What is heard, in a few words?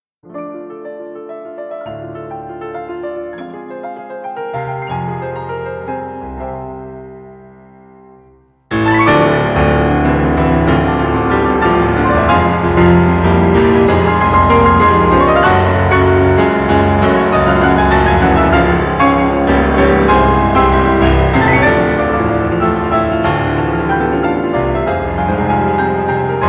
Piano Duo; C83